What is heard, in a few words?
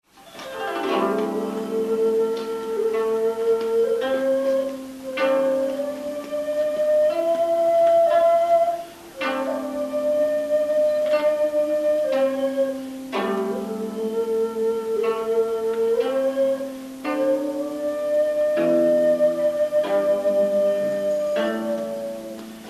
Pai Xiao flute